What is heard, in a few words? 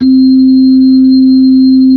B-3, Hollow